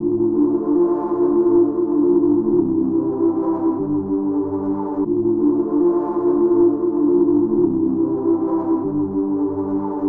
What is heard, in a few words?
Synth Chords Loop